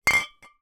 foley 2x wine bottles full bash together

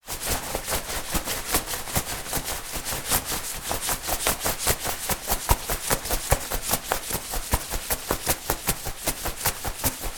foley raincoat movements running fast

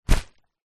household umbrella close grab hand

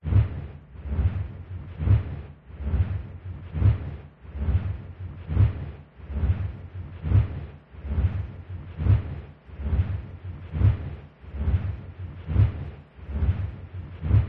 industrial wind turbine large spin fast whoosh

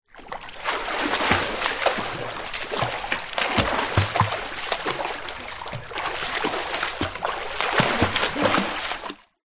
sport child swim in pool short

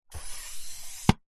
office chair lever down slide hydraulic

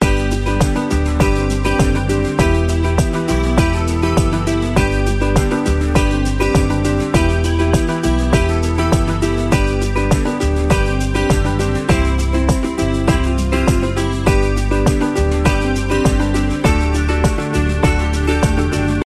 music Happy; orlamusic

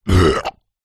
human burp soda

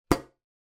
household aquarium lid plastic close

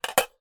household safe small desktop metal close

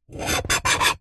knife tip scrape metal surface sharp; zapsplat

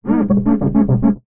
household mirror rub fingers squeak

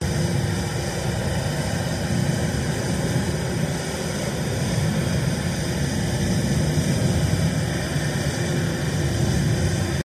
PLANE Glider Interior Fly; TRANSPORTATION; smartsound

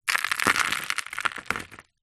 dirt drop onto thin cardboard sheet

